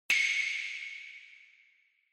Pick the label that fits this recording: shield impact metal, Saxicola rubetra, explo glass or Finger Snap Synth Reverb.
Finger Snap Synth Reverb